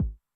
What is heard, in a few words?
BD Med Tune Med Decay, bass drum, 909; classic machines; 909mod